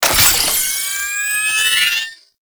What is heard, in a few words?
Sniper Drone Charge Up; Warframe; Lasrian